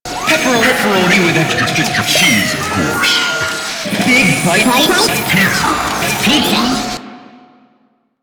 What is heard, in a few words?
Cinema Broken Display Loop; Cinema Big Bytes; Warframe; Ambience; Nineteen Ninety Nine